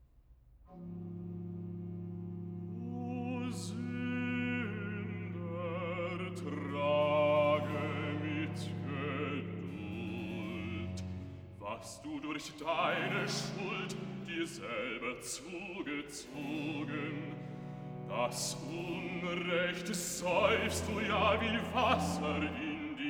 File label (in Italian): Cantata, Recitativo; BWV 114 'Ach, lieben Christen, seid getrost'; Bach; Lund; 17th Sun after Trinity